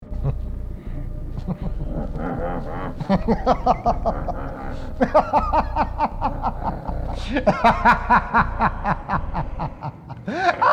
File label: Laugh